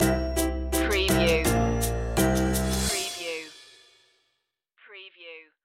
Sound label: spring steppin stinger